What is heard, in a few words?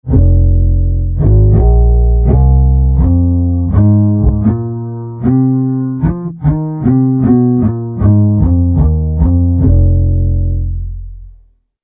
Bowed Bass